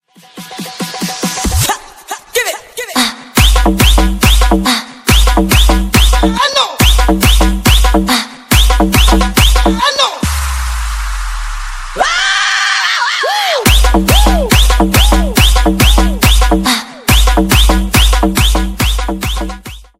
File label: Tribal Dance